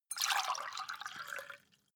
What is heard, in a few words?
glass pour fast; soundpool